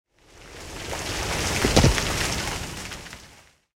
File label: tanks explosion ground; War Thunder